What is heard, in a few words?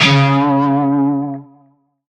Shawzin Flourish Oct Two C Sharp; Music; Warframe; Narmer Shawzin